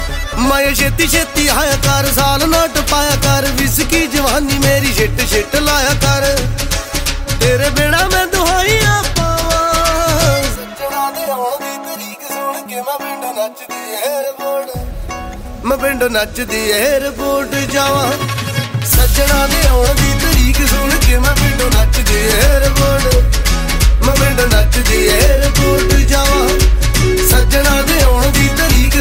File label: new punjabi song ringtone